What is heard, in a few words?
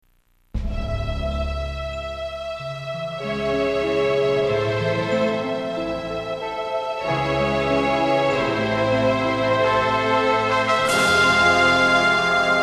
river intro; Indiana Jones and the Infernal Machine